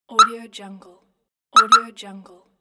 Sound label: car alarm lock